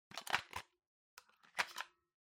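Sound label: Ceiling Light remote control Battery Insert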